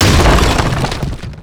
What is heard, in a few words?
engine destruction; damage; engines